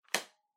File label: Portable Cassette Close